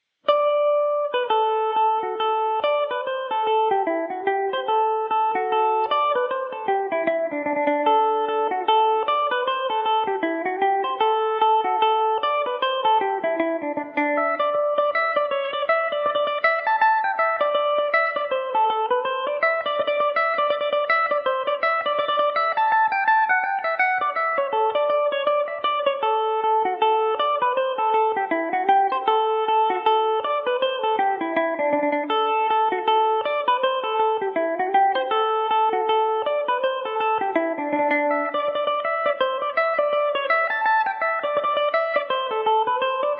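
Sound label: D mixolydian revelation, Irish mandolin; beauty spot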